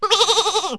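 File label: small sheep, animals; Wired for Sound Pro CD; Aristosoft, Inc.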